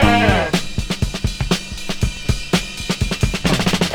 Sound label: 122 bpm drum loop sample g sharp key